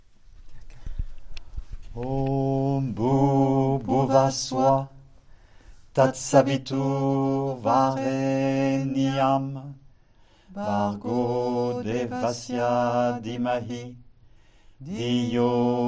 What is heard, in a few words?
Mantra Gayatri